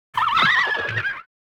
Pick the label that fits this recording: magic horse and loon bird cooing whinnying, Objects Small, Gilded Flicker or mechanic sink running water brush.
magic horse and loon bird cooing whinnying